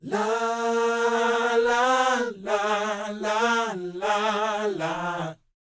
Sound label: NA-NA D#; Spectrasonics Vocal Planet